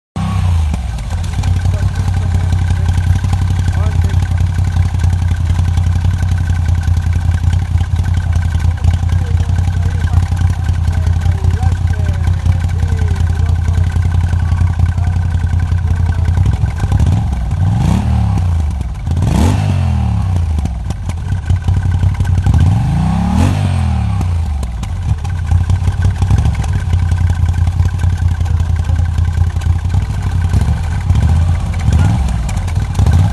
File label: Moto Guzzi V85TT Exhaust Audio